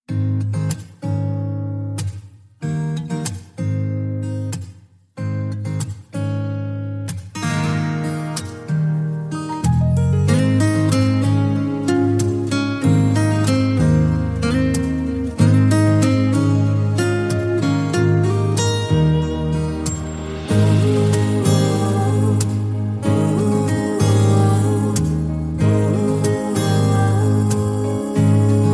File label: Instrumental Indian Ringtone